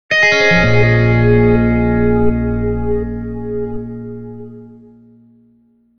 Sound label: Falling message, ringtones; Sony Ericsson T650